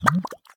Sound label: Mud Gulb; Zombie Catchers